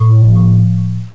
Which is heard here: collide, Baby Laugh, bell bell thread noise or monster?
collide